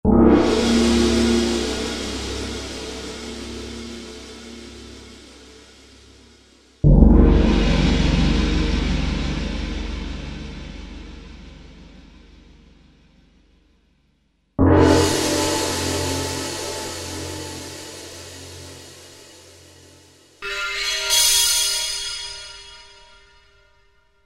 Asian Gong, Korg TR-Rack

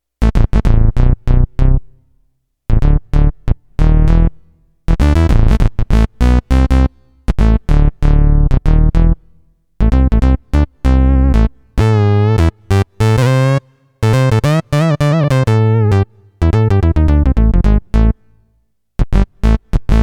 Mono Octabass, Roland VC-1

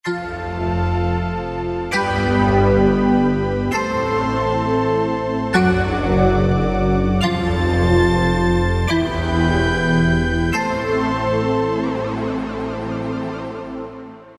Luna-Pad, Korg M1; Factory preset examples

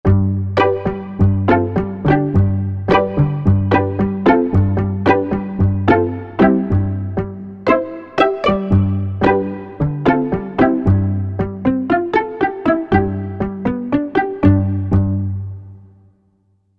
Velo Pizz, Korg MSC-1S; Korg MSC-1S Variety

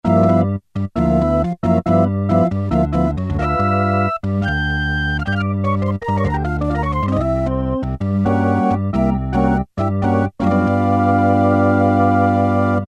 mix combo, Technics SX-K450 Voice Combinations

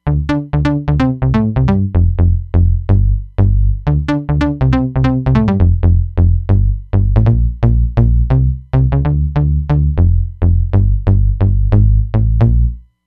Syn Bass; Roland Fantom XR

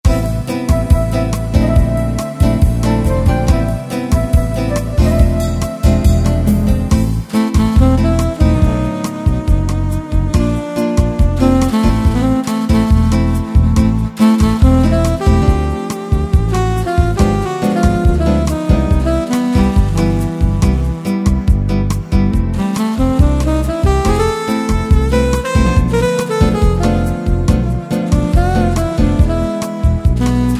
Bossa, Yamaha YPT-400 styles

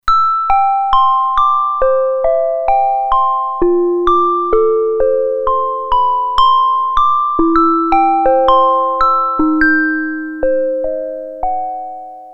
Bells, Roland Alpha Juno-2; Original Sound Chart patches

